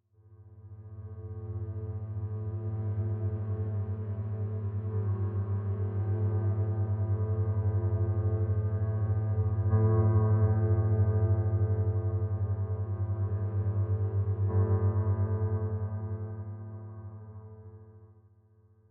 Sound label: Piano Slider; M4L Bulbs; Szymon Kaliski